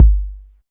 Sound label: verby kick drum sound g key